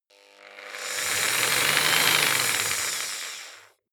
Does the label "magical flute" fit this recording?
No